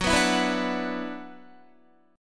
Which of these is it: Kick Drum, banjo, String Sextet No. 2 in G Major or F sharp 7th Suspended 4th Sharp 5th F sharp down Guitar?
F sharp 7th Suspended 4th Sharp 5th F sharp down Guitar